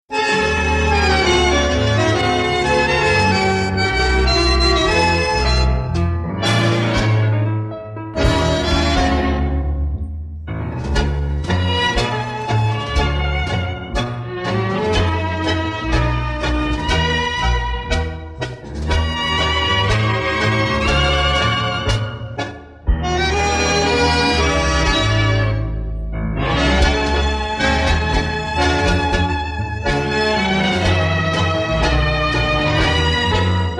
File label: Tango